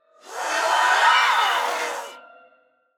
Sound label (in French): Banshee Cri; Phasmophobia